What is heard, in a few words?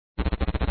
move; battlecity